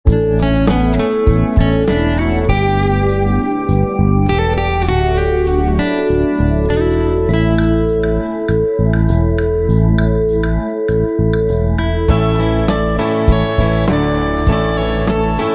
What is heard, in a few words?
Light Tune full mix